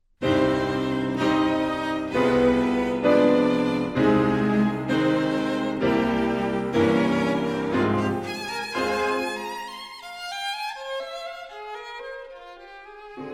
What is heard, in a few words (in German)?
Klaviertrio c-moll, Allegro; Dvorak